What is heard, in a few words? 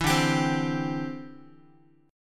D 7th Suspended 2nd Suspended 4th D, Guitar; D7sus2sus4 strummed